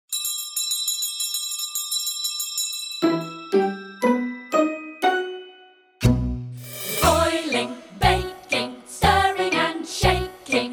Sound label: Kitchen Jig; victorian villainy